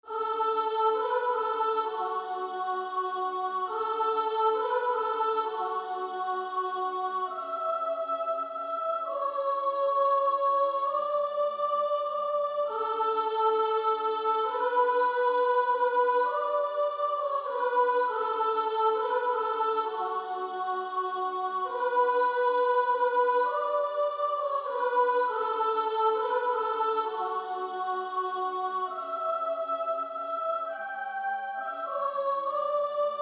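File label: practice music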